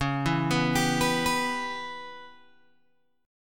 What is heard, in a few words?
Db Minor 7th Flat 5th Db down Guitar Open G